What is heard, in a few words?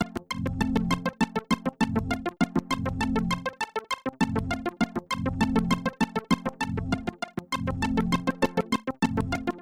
Electro